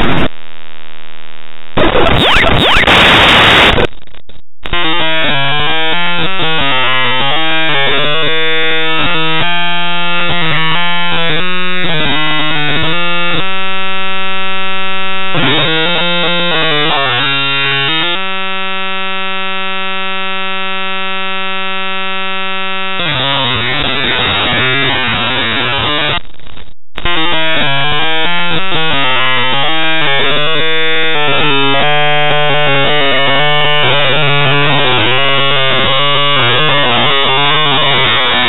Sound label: forest walker 8000Hz